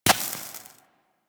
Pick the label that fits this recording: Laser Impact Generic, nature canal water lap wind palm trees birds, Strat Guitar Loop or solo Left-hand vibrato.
Laser Impact Generic